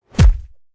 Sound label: punch